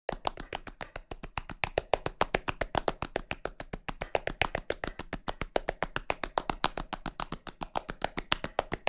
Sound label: Hands Mix; War Cry (Cayovinto)